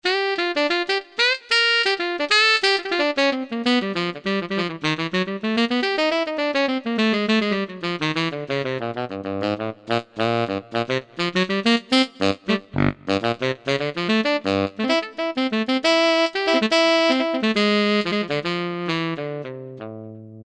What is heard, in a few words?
Tenor, Yamaha TG500